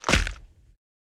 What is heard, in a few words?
criticals hit; Minetest; VoxeLibre